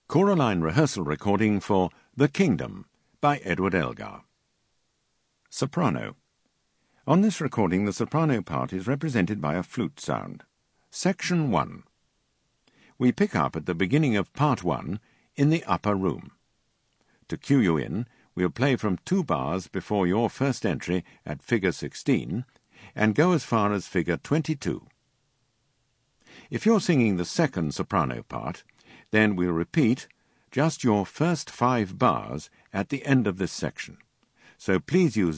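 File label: Kingdom Soprano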